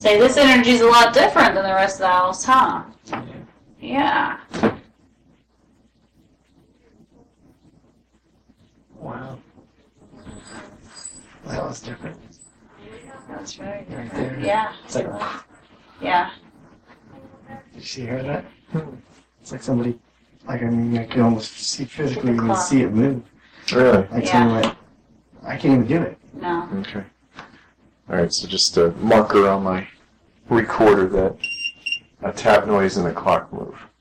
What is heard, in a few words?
Clock